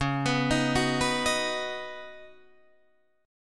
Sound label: C sharp 9th Suspended 4th C sharp down Guitar Drop D, chord